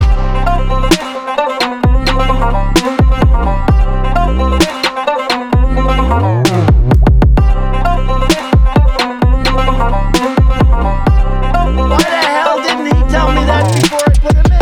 banjo beat

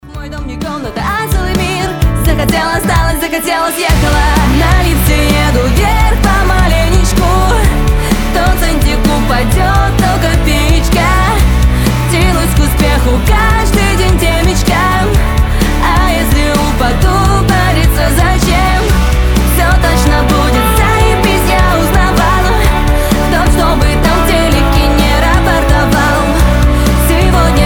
lift acoustic

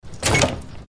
doors locking door opening; Roblox

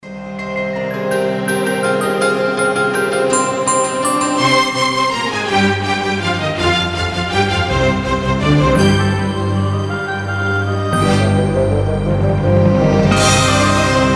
carol of the bells classical

